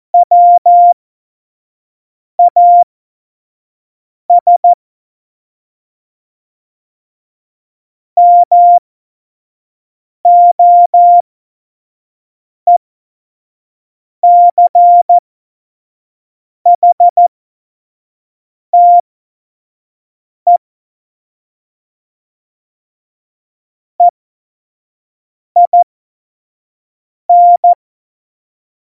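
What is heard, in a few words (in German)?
Witz, cw morsen; Morsekurs; Witze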